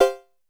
SYNTH Blipo; Polyend; Legowelt

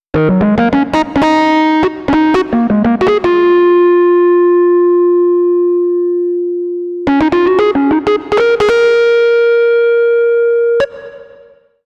Rhodes Solo, Cinematic Funk; Sony Creative Software; Cinematic Funk Urban Tone Poems

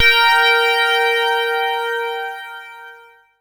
BELL-GONG, A#; Big Fish Audio

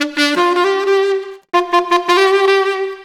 Sax Funky; Drum N Bass; Misc; Sonic Foundry (Sony Creative Software); Knutrix Drum & Bass

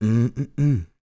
MOANIN, Vocal Planet Blues & Soul

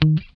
GUI D3#, E-Gui Muted; Sound & Vision; Gigapack I CD 2

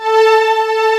DIDI PAD, SYNTHESIZER DIGI PAD; AKAI S6000 CD-ROM - Volume 1

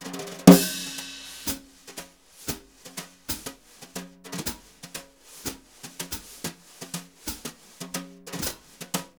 BRU, BRUSH; Univers Sons; Jazzistic

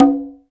Perc, Alesis DM5

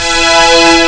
string; Amiga Soundtracker Sample Packs

